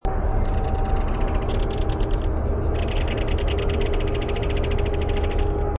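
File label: rattle, Belted Kingfisher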